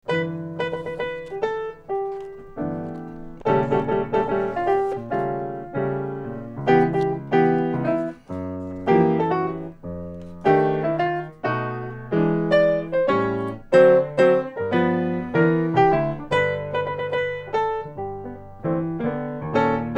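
playing, A bright-toned swinger in that iconic style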